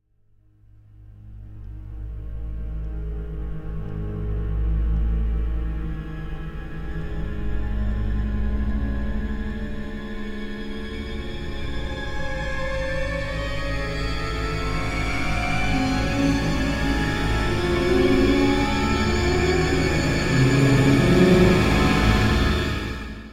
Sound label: Symphonic Hit Drawing Close To The Fear - Long Pad; Hollywood Edge; sound designer's toolkit v2